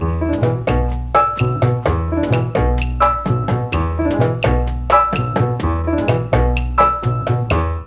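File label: bass strings, Mellotron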